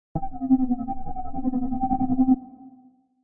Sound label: Haunting, Deep creepy short sound excellent for evoking fright and fear.